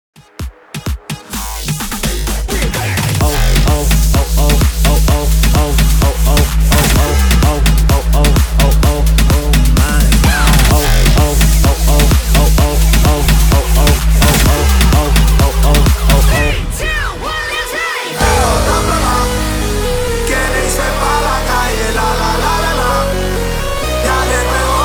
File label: big room, 128 BPM